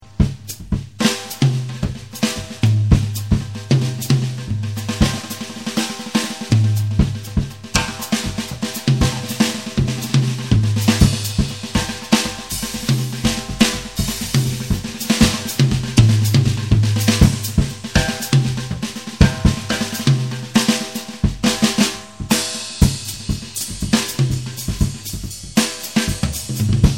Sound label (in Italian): ASSOLO; claudiodrum